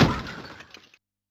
CAR CRASH; Yamaha DD-65

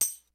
DANCE KIT, DRUMS; Kid Nepro; Elite

